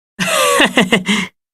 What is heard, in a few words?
laugh